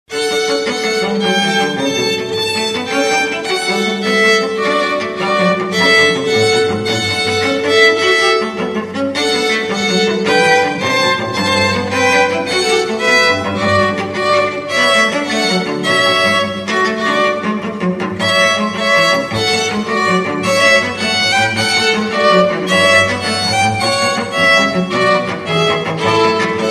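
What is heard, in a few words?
chamber music string quartet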